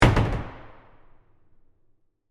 Door Close Closet, Foley, Door, Close, Closet, Door, In, Gym; BlastWave